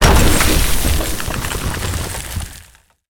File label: Floor destruction; Ancient Modular Tombs